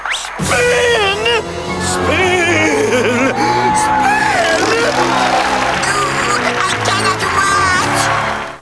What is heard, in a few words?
spin